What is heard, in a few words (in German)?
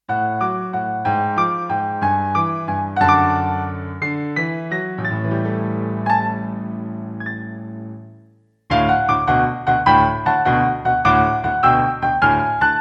Hochzeits Boogie Piano